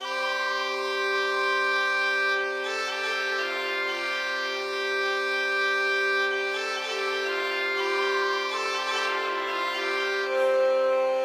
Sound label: Music Ireland Calm